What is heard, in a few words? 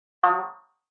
resonance, glitches growls words and tones; Ze Franks Pain Pack